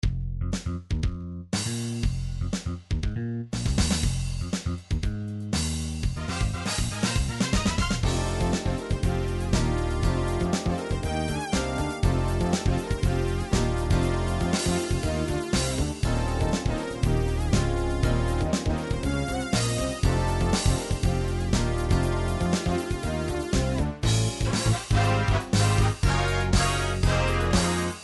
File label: pop, midi; song book